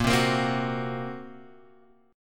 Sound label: A sharp Suspended 2nd Sharp 5th A sharp, Guitar Open G